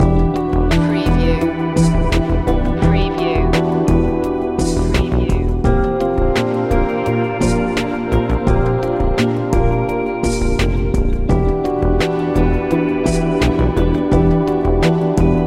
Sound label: sensual and enigmatic loop